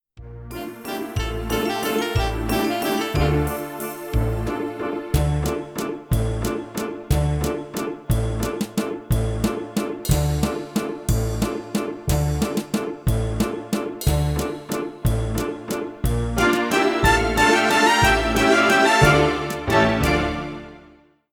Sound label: Second Waltz; songpakete demo